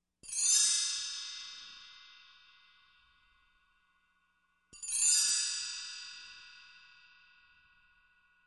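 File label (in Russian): два перезвона; Dva perezvona; Перезвон колокольчиков создаёт волшебные звуки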